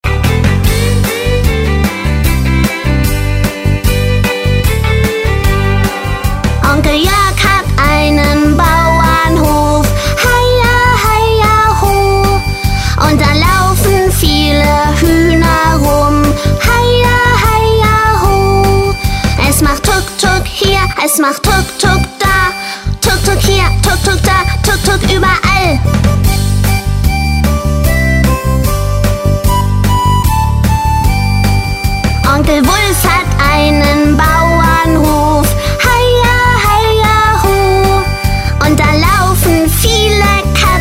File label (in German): Kinderstimme Bauernhof Song